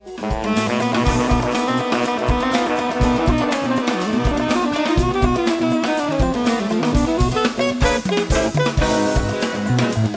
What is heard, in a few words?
Bass sample